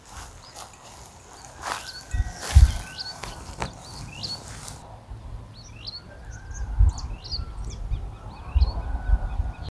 Churrinche; Pyrocephalus rubinus

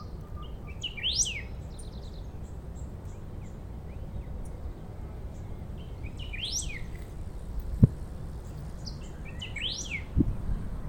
pepitero, Bico-duro (Saltator aurantiirostris)

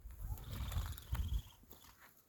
ojo desnudo, Palomita Ojo Desnudo (Metriopelia morenoi)

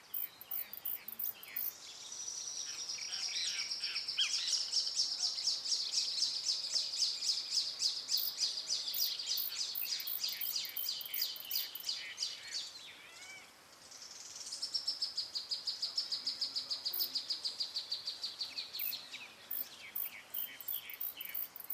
Phacellodomus sibilatrix con nido campo; Res Don Victor

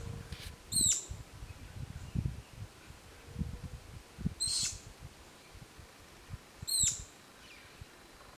ticotico comun; Syndactyla rufosuperciliata acrita

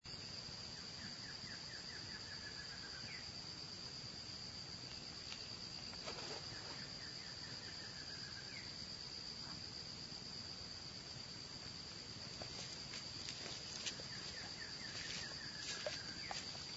Choca Listada, Thamnophilus doliatus; RP101

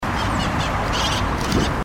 chiripipe cabeza verde

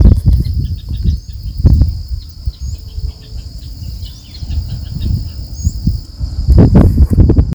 Burrito canela

